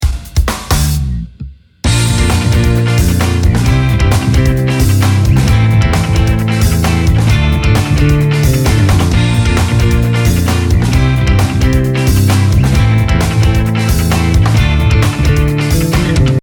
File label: paradise guitar